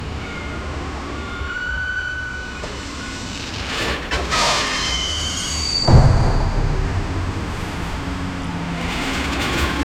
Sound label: roaring car engines tires